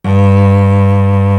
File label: solo cello; East West; Global Sounds